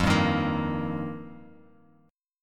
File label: F 7th Suspended 2nd Suspended 4th, Bass Standard; F7sus2sus4; strummed; JGuitar